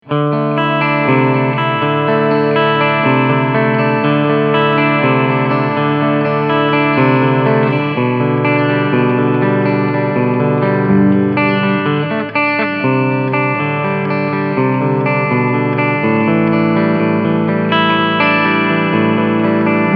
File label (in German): Humbucker unverzerrter Klang